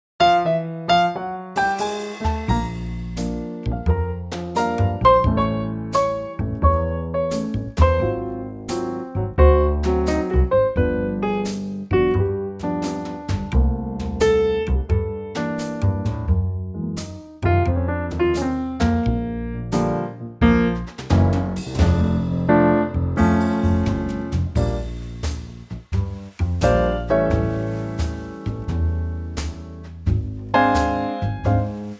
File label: trio Bb; key - Bb - vocal range - D to Bb (huge range!); The wonderful song from "the Colour (Color) Purple" in a superb Trio arrangement